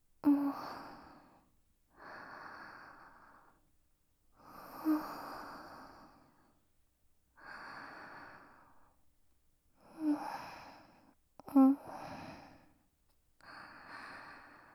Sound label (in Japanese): 寝息音（右）, ASMR